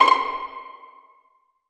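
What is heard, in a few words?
string pizzicato C5 mezzo forte left; Sound On Sound - Peter Siedleczek's Orchestra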